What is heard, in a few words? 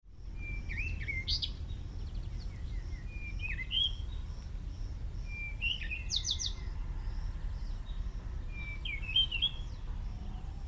cape robin chat